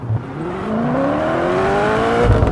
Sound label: first, vehicles; bmw m3 g80